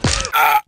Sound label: fall damage death